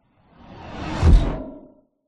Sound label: fantasy magic spell zap whoosh impact dark airy